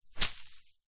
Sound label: foley plant fern broad leaved hit solid hard ground